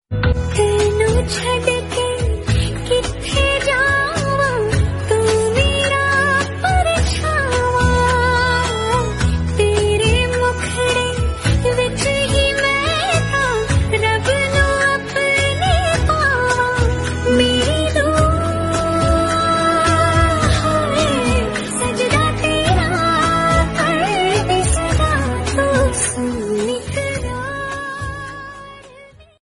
female version

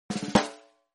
drum roll notification sound

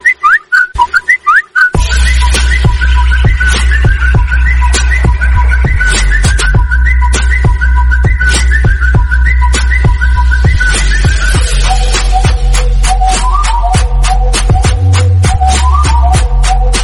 samsung flute remix

